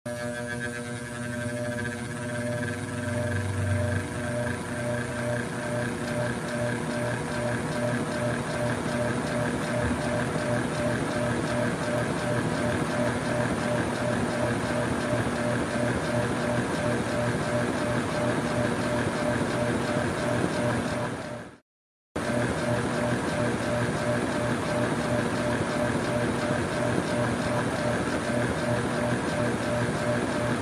Old Ceiling Fan sound starting and running

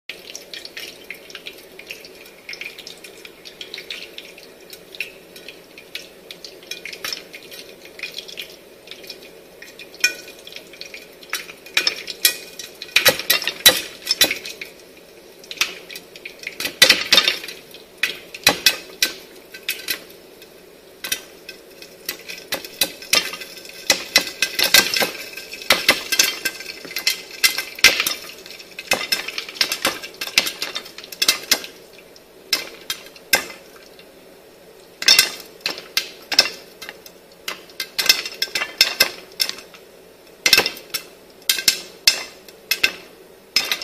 Making Popcorn Sound

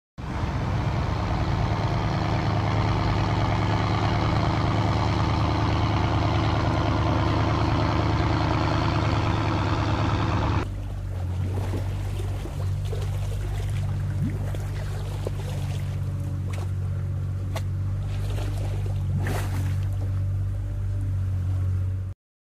Motor Boat Motor; Barco

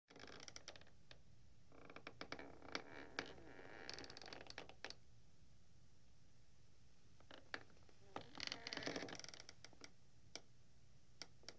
Wooden Floor Creaks and Bends

